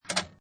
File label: Pinball Sound Up And Down Clank; Video Games (Sound Effects:Games)